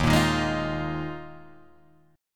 D sharp Suspended 2nd Suspended 4th D sharp Guitar Open G